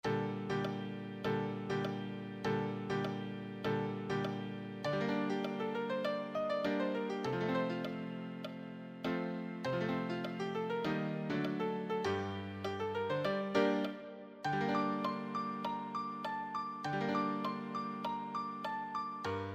solo, piano solo

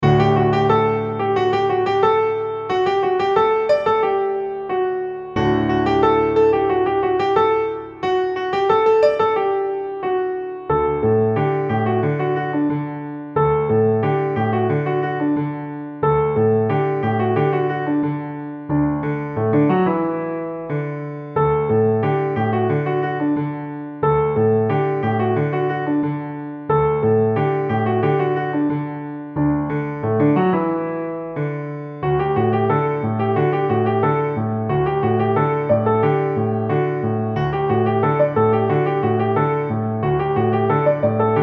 solo, piano; Iddaru

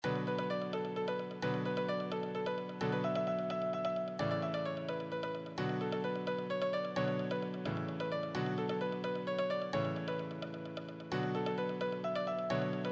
easy solo, piano

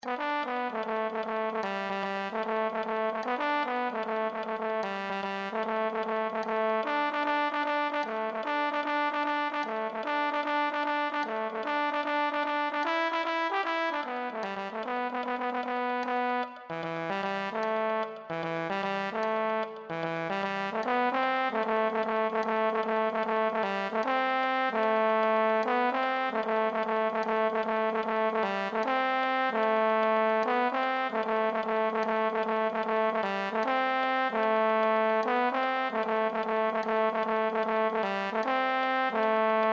euphonium

